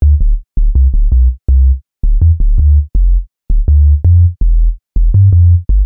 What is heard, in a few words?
Akai S950 Sinewave